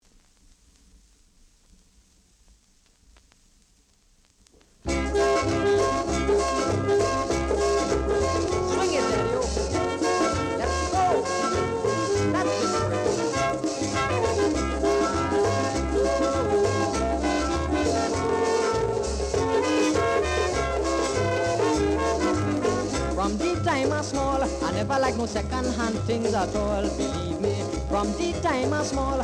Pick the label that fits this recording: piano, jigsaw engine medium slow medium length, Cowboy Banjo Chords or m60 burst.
piano